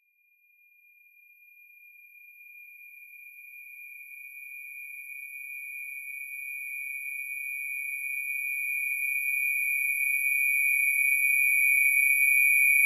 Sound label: Trailer Tools Tonal Riser; Production Music; CATastrophic